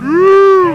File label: Wooooo, wavetables; Animoog